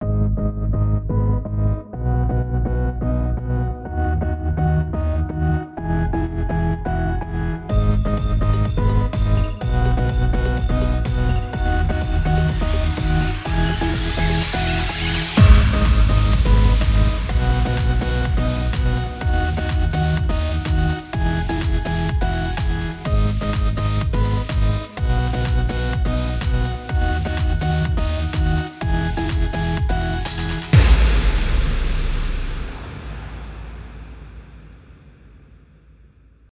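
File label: akkord elektronnij zvukovoj sloj vibriruet, electronic chord sound layer vibrating; bistraya ruka dostupna korotkaya zvukovaya dorozhka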